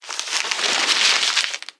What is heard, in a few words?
Crumble Paper; blue archive